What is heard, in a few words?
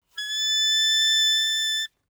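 Eb Clarinet fortissimo A4 stereo; Woodwinds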